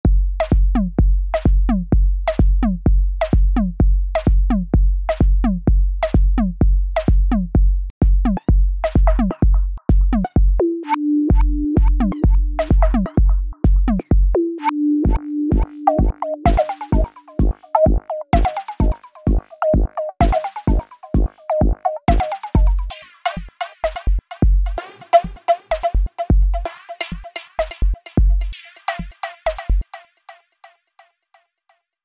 Electro Madness; Multitude

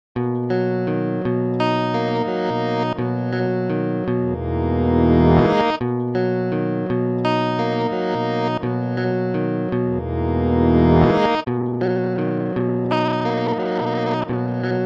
Electric Guitar; Stator

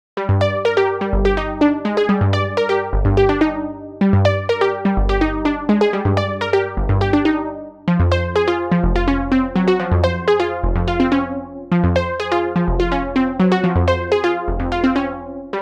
Trance Synth

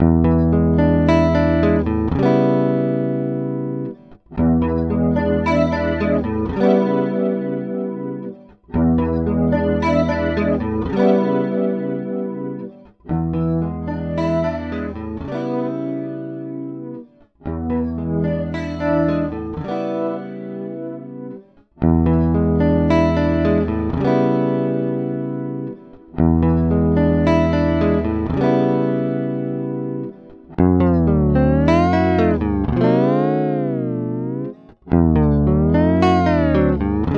Electric Guitar; Blend

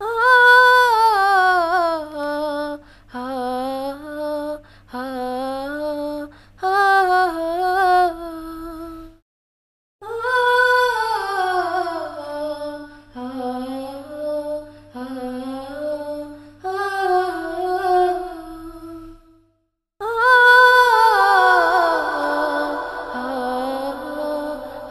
Female Vocals

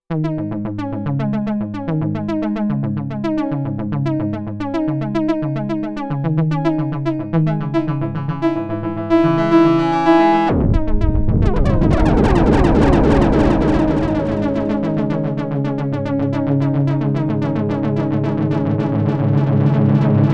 Pitch Glides; Korg Time

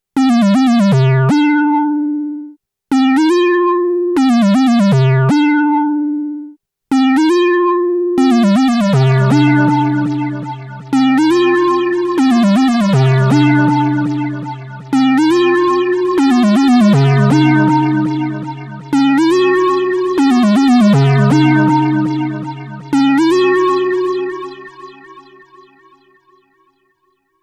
KORG Resonant Pluck; KORG Isomer

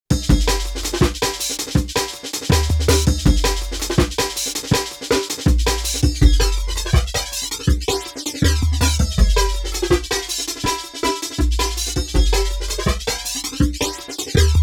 Jungle Beat; KORG Dipole

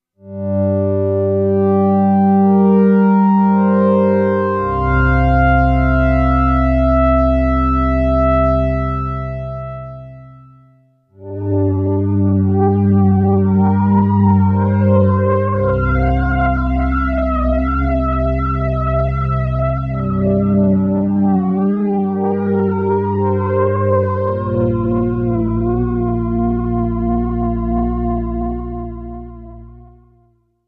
KORG Stator - Gentle Poly (prologue)

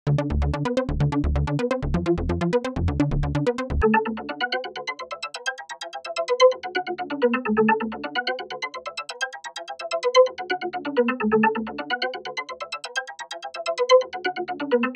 Trance Arpeggio; Hexonator

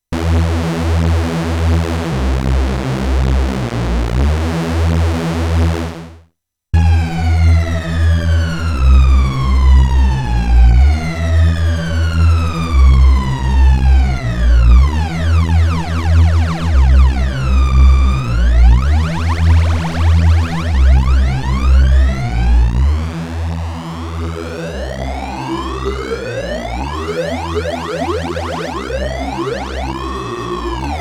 Synth Lead; KORG Eternal

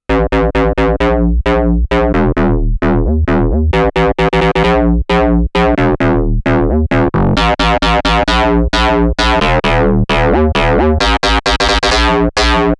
Bass Blast, KORG Node

